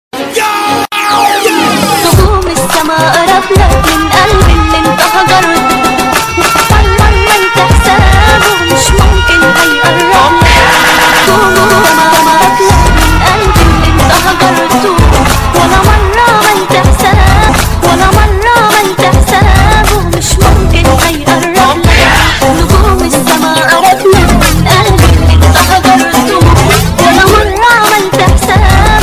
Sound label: Arabic Ringtone